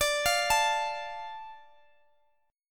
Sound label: D Minor D Guitar Open G